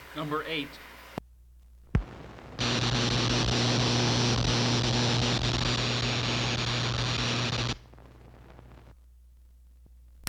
Buzzing Sparks

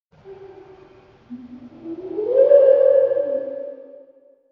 Theremin Whistle

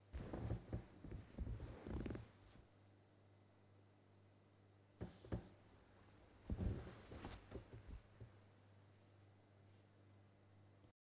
Sitting On Couch

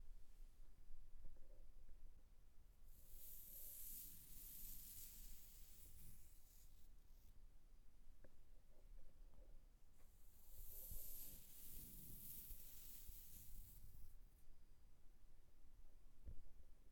Nails Gliding On Skin

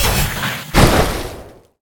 Splash boom

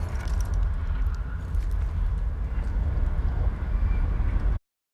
Ambient Field

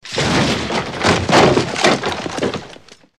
Crash Door Crush

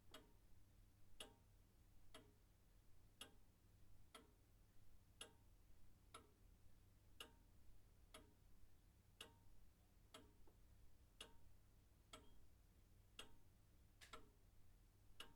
Outside Grandfather Clock Tick

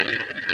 Marble Rolling

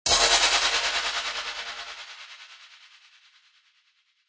Stab Transition Effect

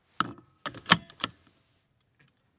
Seatbelt Click

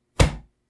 Punch Kick Being Blocked

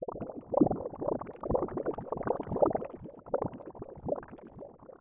Synthetic Water

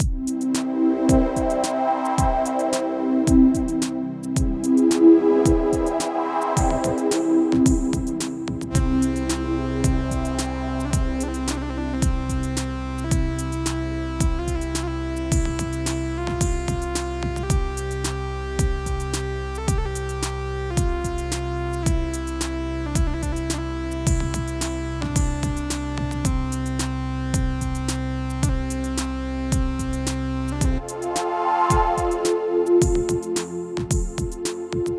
Bagpipe Shredding

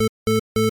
8 Bit Time's Up

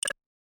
Videogame sounds

